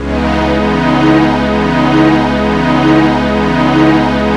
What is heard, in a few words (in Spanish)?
portadora vocoder